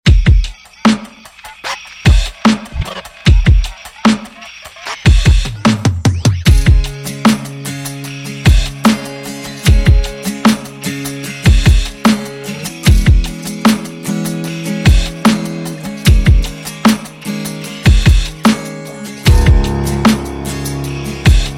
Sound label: Diss All (Instrumental); BandMusic